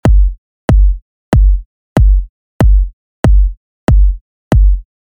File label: kick arcade; Output